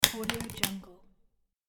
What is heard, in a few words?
credit card drop